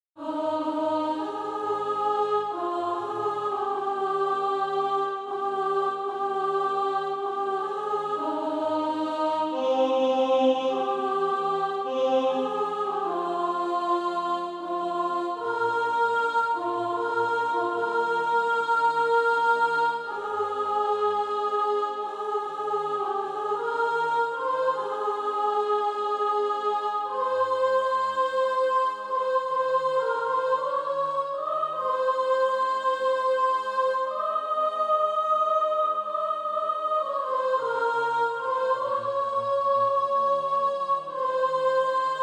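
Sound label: soprano, Practise Music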